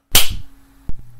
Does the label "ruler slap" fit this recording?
Yes